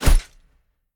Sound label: catch; smite; god atlas